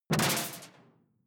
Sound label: sci-fi alien bubble; swooshes-swishes